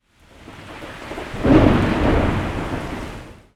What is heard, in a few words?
Thunder